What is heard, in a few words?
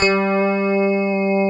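JV electric piano; Pro-Rec - Synthsonic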